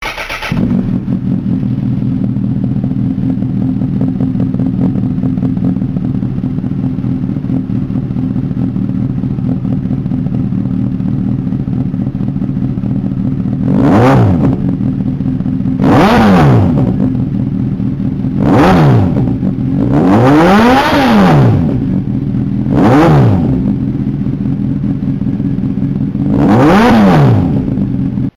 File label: CBR1000RR, exhaust sound; honda; Hot Bodies